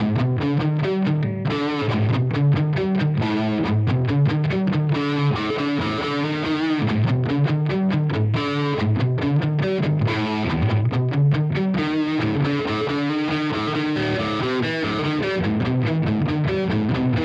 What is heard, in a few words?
Strymon Iridium Punch